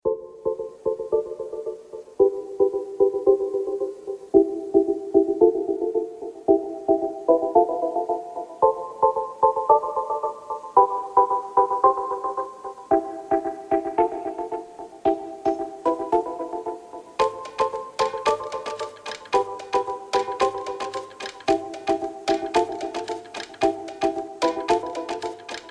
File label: instrumental